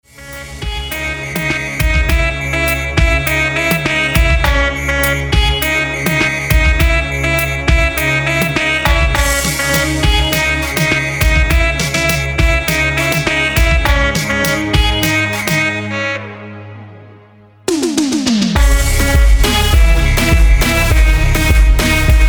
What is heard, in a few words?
SAMBA (51bpm)